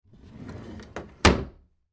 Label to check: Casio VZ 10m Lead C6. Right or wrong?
Wrong